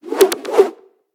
Stick Spin; smite; raijin

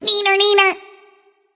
nv tongue; smite; voicepack; ratatoskr

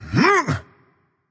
grunt attack; chaac

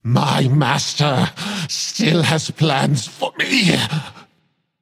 death; chronos

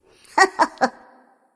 nv heh heh; smite; voicepack; ratatoskr

